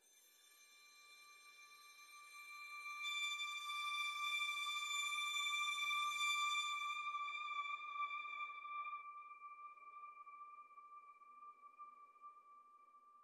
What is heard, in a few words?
Noises